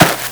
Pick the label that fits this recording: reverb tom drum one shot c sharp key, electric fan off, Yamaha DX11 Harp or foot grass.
foot grass